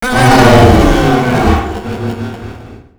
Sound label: Voice Monster Laugh